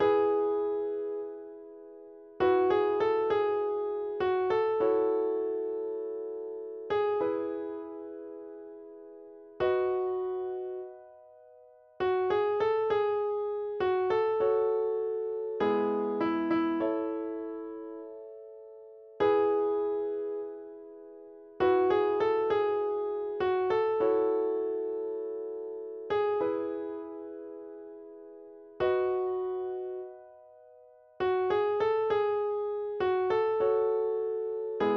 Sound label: instrumental